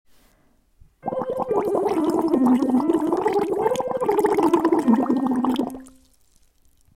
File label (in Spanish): Bocachicle U descendente